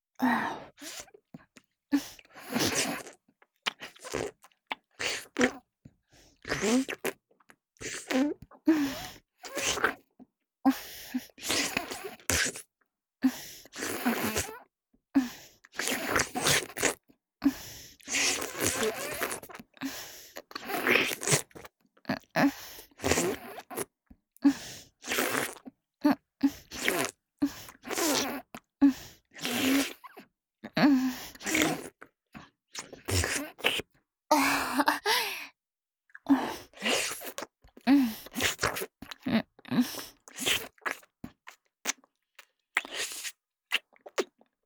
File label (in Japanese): 亀頭をしゃぶる; 実用ボイス抜粋集